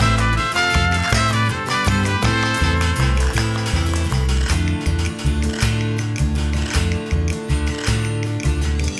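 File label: music food inferno suspense no vocals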